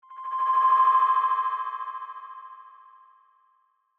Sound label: Dematerialize Beam, Ray, Scifi; Tech